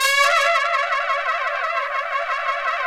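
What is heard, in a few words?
trumpet shake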